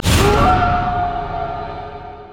Howl

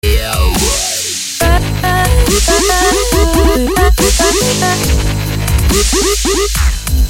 Dubstep notified

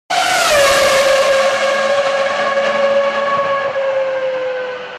F1 Whatsapp Ringtone

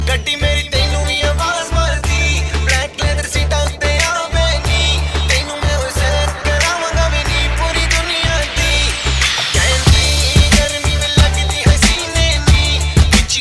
Hip Hop

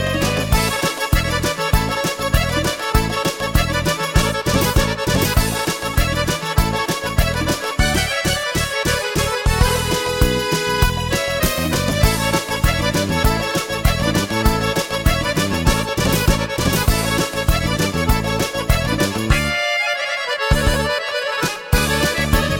Balkan Sound

